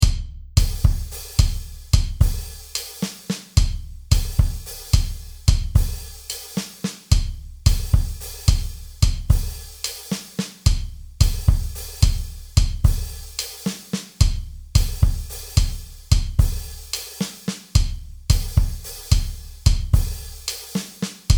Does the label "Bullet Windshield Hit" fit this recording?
No